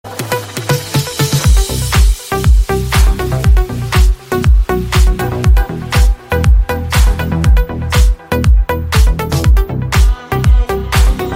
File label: Awesome iPhone Ringtone; ringtones